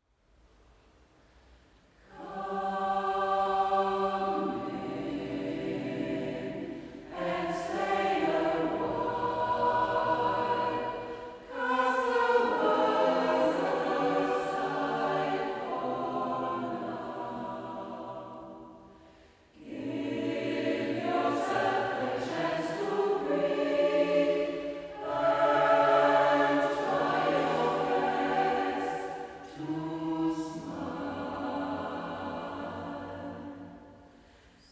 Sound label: Tutti